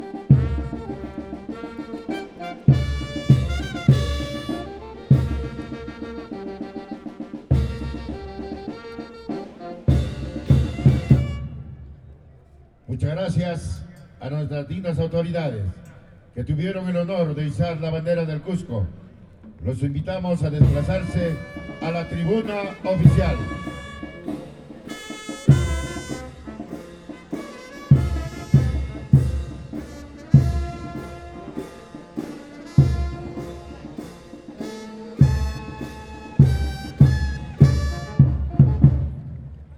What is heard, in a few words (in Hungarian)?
ismétlődő zenei hangulati elem